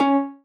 marimba rainbow; ゆめ2っき

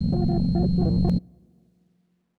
low drone; ゆめ2っき

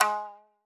marimba shamisen; ゆめ2っき

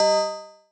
marimba; ゆめ2っき

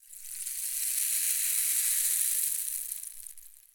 pour

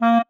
marimba penguin; ゆめ2っき

